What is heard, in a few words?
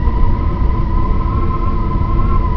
tab wind